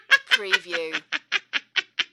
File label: Witch Cackle